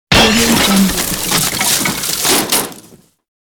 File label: crash effect; urban sounds